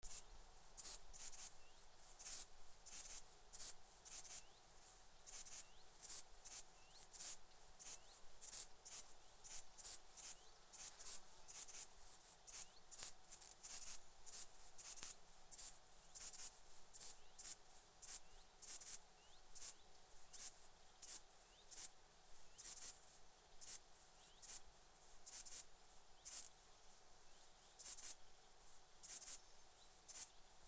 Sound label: Ephippiger ephippiger, Thermenlinie